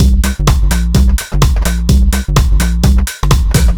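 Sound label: 127 bpm no snare, combi loops, nu dance electro; Zero-G; Soundclash; Republic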